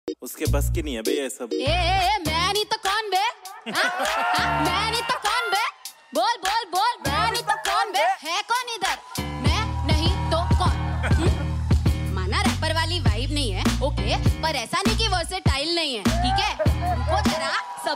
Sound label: rap ringtone